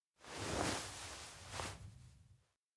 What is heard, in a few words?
stroke back; aino; anemoi